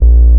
BASS, Drum & Bass, 130 BPM; Ueberschall; Drum & Bass Resonance

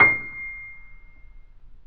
Mp C 6 off, Grand Piano off mic; AKAI S6000 CD-ROM - Volume 2

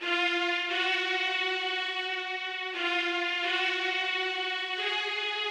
Hip Hop and R&B Drumloops

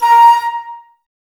flute, Wood Winds, Flutes

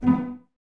CES B 3 Pizz, Cellos, String Ensembles; Miroslav Vitous

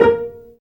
Vcs Pz, String Sections; Roland LCDP13

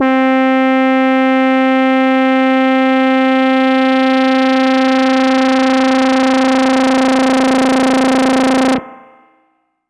lead C4, EMS VCS3; Polyend; Simulacrum Sounds; 1 Shots; Synths; Leads and Keys

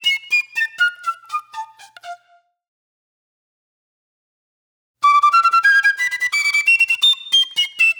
Shrill Flute; Sonic Foundry (Sony Creative Software); Manhattan Flutes

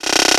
Buzz Fill

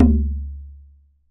DARABUKA; WorldSounds

